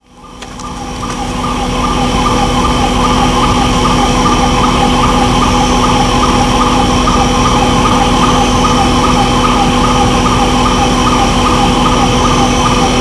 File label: Odontophorus capureira; Itatiaia NP; Brazil; Silveira